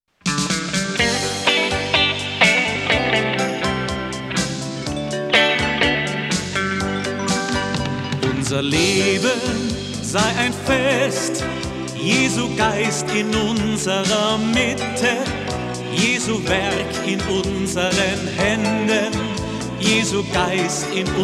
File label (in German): Gesang